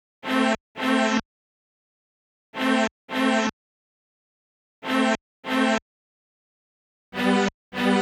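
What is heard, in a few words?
Hip Hop Strings, Amin, 104 bpm; Pro Tools Essential Loops; Digidesign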